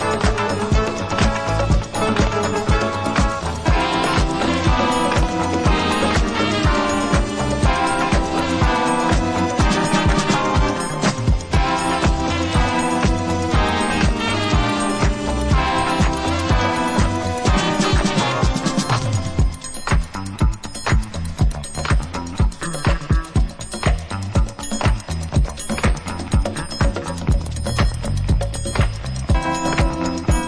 Instrumental

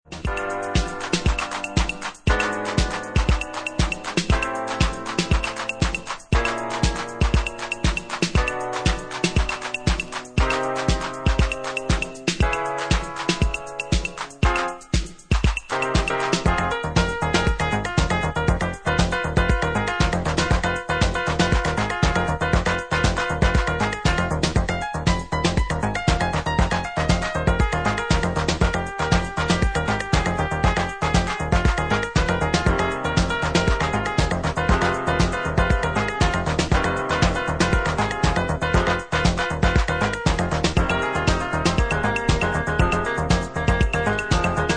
piano traxx

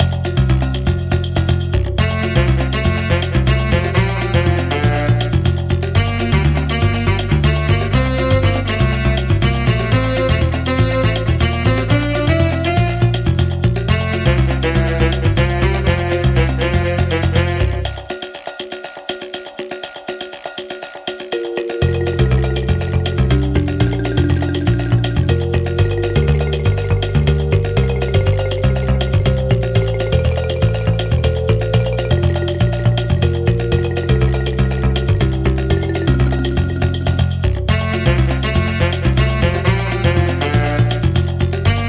Steel Drum Rhumba

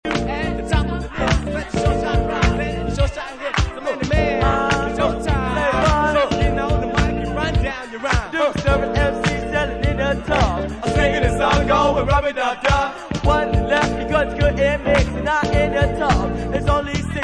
vocal; disco

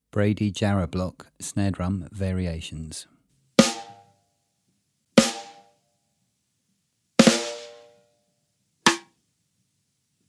Snare; Brady Snare

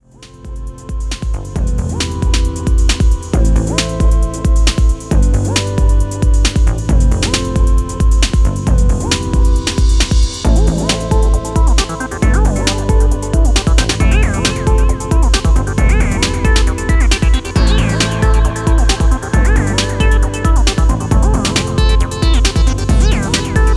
Sound Synthesis - Magical Harmonies

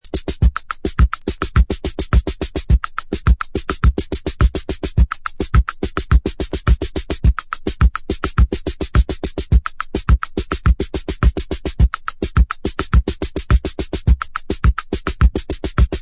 drums

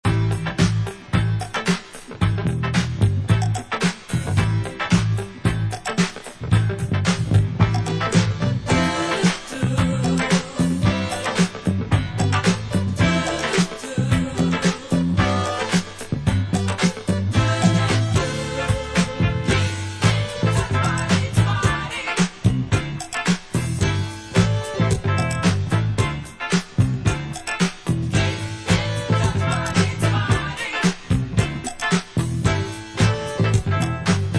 Instrumental

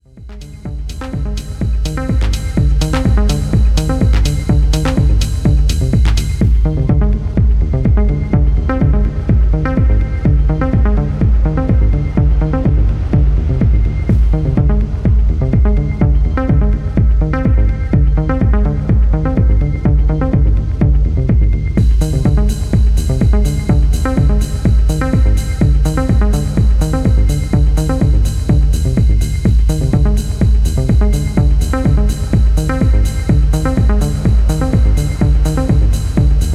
DX3 Analog Bass Seq

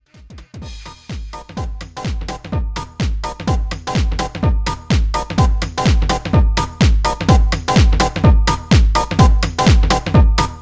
mo sax

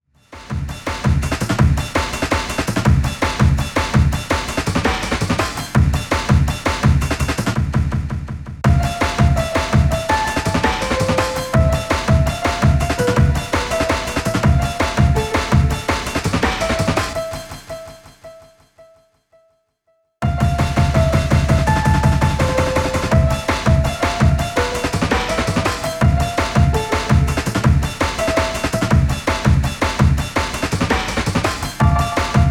Breaks

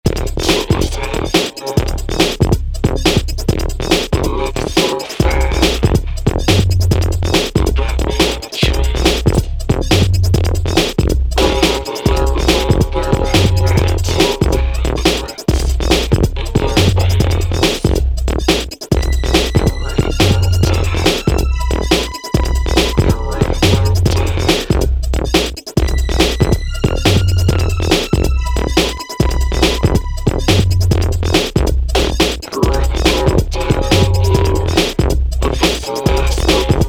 Digital Techno Bass Mix

